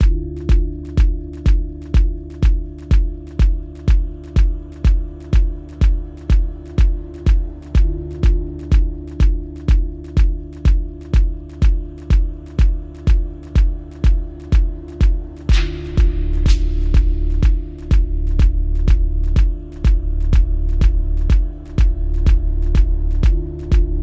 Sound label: Afro Remix